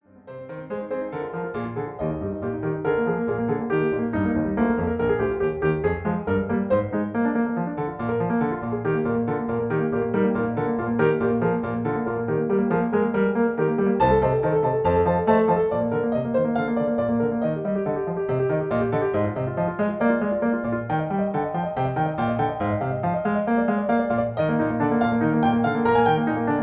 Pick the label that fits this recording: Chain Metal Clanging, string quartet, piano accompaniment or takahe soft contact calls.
piano accompaniment